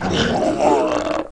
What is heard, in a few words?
zombs death; The Monster Plane; kolmods death